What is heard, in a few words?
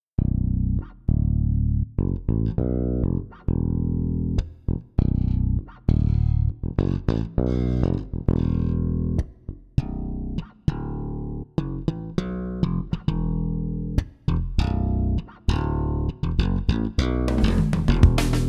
bass; ManyBass; Manytone